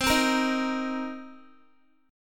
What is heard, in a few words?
C Minor 7th C Guitar; Cm7 strummed; x,15,12,15,15,x